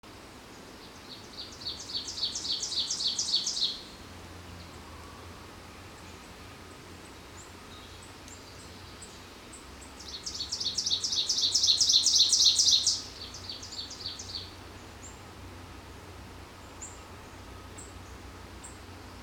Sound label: Ovenbird Seiurus aurocapilla